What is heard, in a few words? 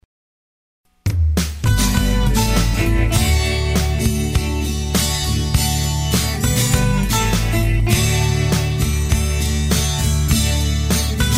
Melody; open mic; Contest